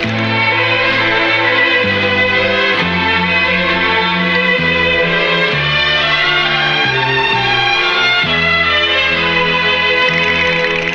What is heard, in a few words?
strings, Sad